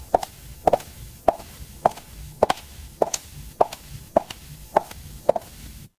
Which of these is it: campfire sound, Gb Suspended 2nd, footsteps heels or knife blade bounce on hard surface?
footsteps heels